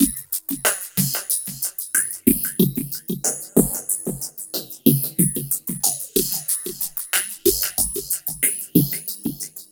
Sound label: RING HOP R, 60-110 BPM; Spectrasonic Distorted Reality 1